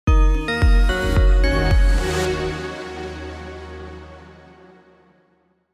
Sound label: opener logo